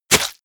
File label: hit blood light